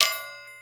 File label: Marblekick; Mirtahm; moul-assets